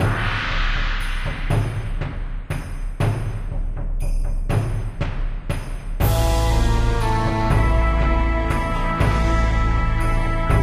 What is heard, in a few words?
Dungeon